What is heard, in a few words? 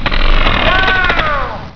momma pain